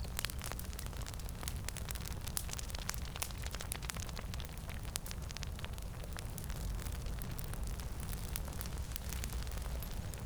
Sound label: Fire Sphere Burning Enemy Loop; TANK; 3Souls